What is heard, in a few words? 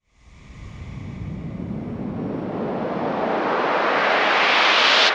Uplifter Trance